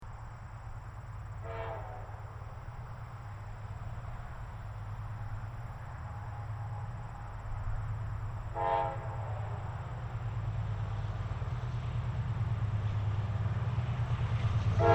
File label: horns; csx k5la